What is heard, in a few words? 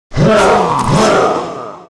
war attack; Mob